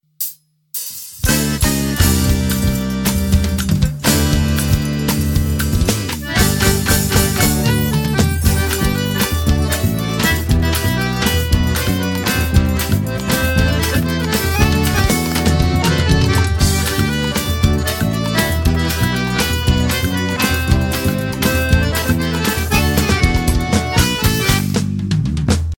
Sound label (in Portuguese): abertura